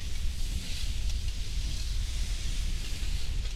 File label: steamtrap loop; Ben 10 - Omnicode